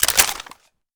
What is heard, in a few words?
M4 Mag out, weapons